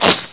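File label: scorpion attack; gearbox; lg scorp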